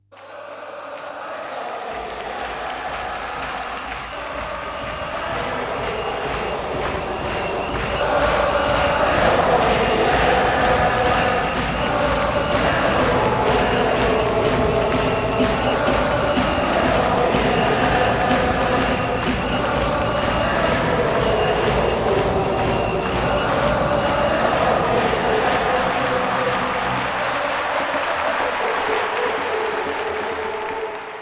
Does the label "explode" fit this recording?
No